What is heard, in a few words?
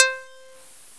hit, artillery; nalquas